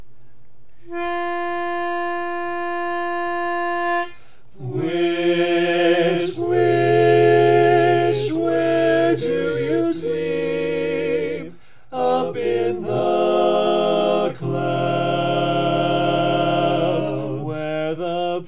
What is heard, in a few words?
Tenor, barbershop